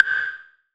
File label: Perc, Gated Reverb; musicradar